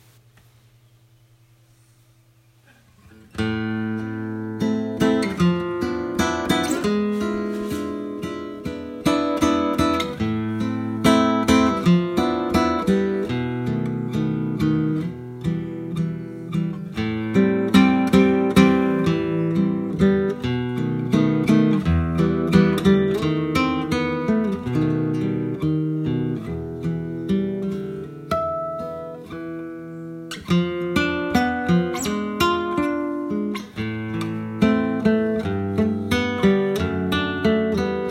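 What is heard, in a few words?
classic concert guitar natural; 1959; manouk papazian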